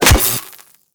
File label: Melee Staff Impact Robotic Flesh; Warframe; Staffs